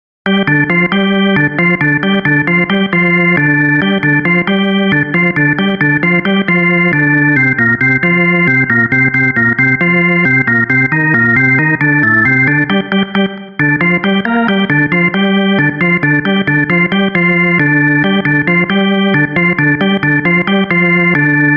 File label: organ; HALion6 : Model C; Mago Sonic